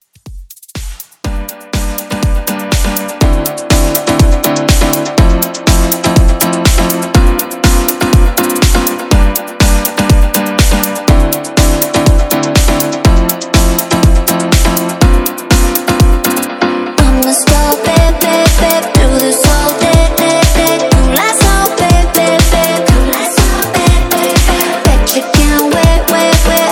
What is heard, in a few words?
Club Pop Dance 122 BPM; Clean Only